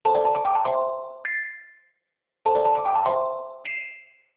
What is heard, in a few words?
reminder